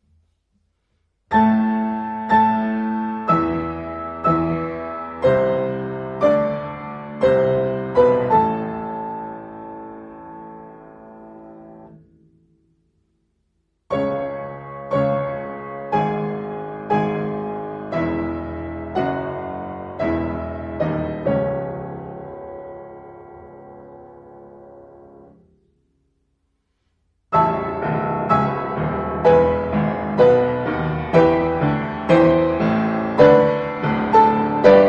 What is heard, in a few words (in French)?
piano seul; Stabat mater; Granval n4